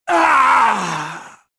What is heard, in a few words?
gasp; Star Wars Jedi Knight II Jedi Outcast; XBOX; bespincop